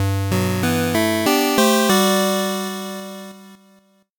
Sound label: 8bit jingle normal